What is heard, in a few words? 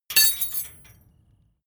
metal tools small drop; soundpool